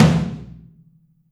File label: Drums Percussion Kit1 Room Mic